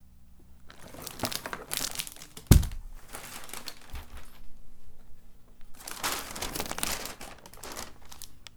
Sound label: Picking Up A Guitar That Had Stuff On It